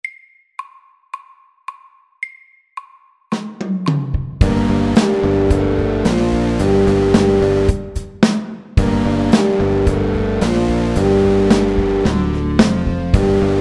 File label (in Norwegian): Metal rock MIDDELS